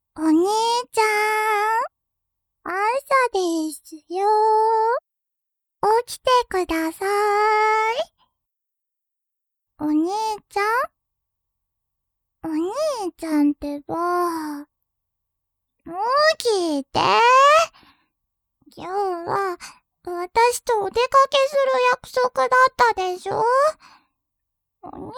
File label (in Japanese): 鈴音